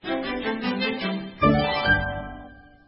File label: game Over